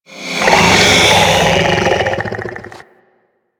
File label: Infested Ancient Idle, Enemies; Warframe